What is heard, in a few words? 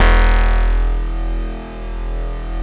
PERC BAS; Synclavier Sampler Library; KEYS AND GUITARS